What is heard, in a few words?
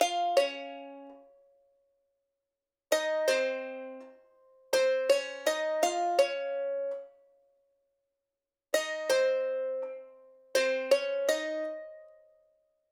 165BPM Omnisphere